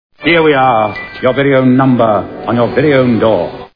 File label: number door; brazil